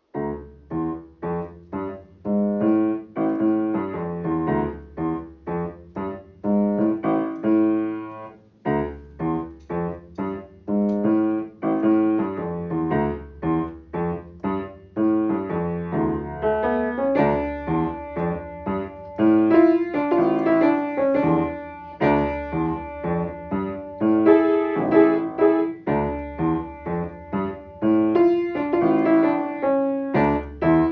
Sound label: piano